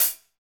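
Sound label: HH, hi-hat; 90 s Sample CDs; Sound & Vision - Gigapack I CD 1 (Roland); Real-Kit m 1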